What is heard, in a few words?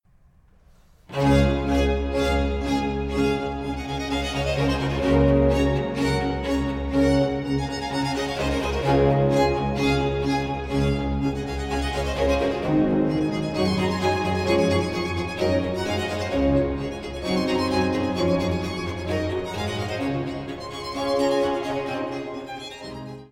D major for 2 violins and basso continuo Allegro